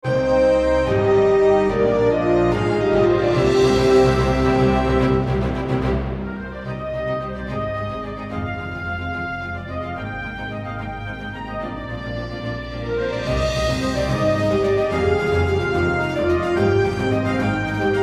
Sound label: Fanfare